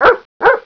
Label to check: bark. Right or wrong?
Right